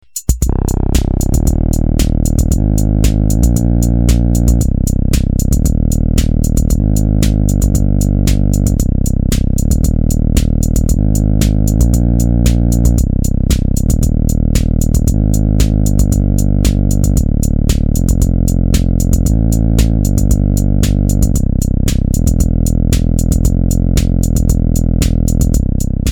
Tuba

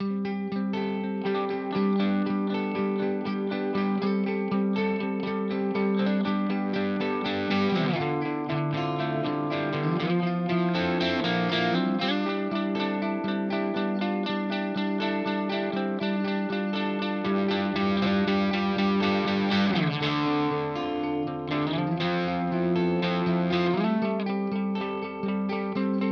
Germanium Fuzz Vintage Pickups Mid Gain; Benson

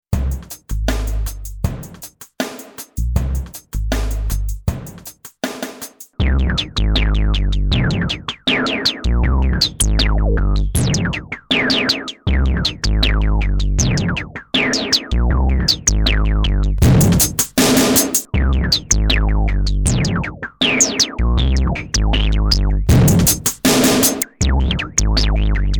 filter beat

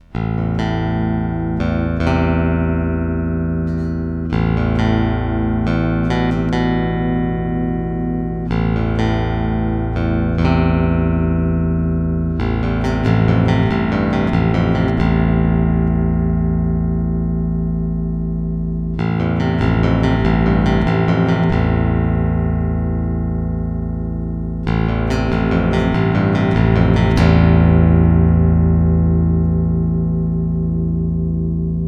Neck active pick

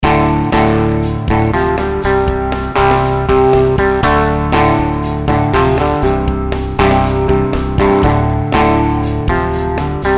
Prominy A Clean

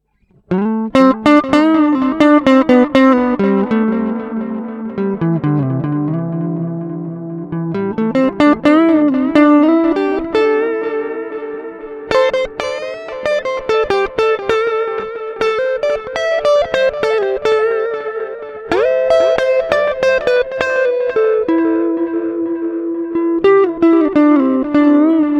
Plug In Roland RE 201 Space Echo

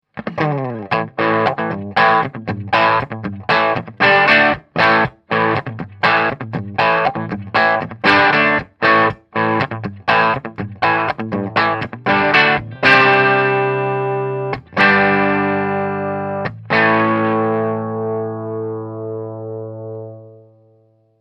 Bias Distortion Original

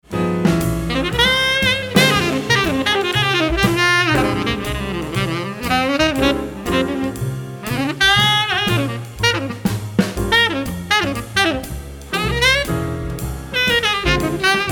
Jazz unprocessed bypass

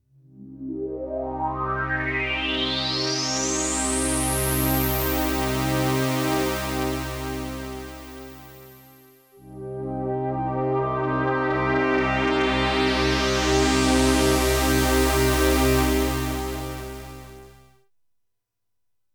OB-X8 vs A6 LP Reso

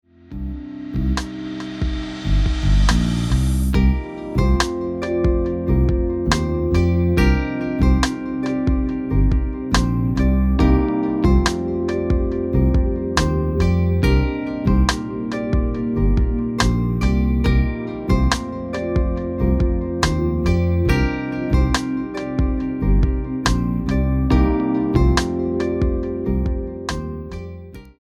Song + Fairchild + SSl EQ

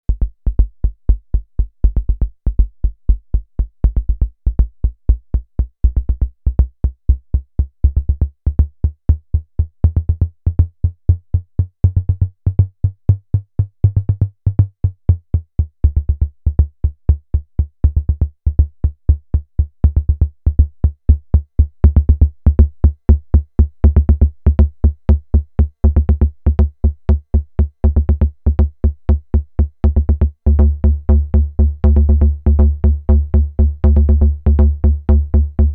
Arturia DrumBrute Impact Tom Low

